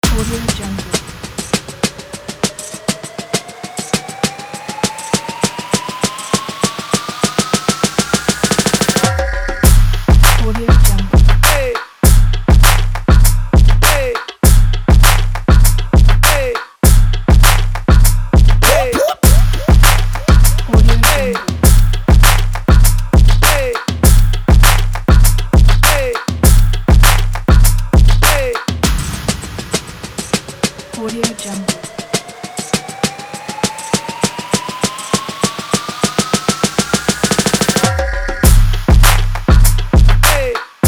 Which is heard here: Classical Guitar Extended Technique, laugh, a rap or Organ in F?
a rap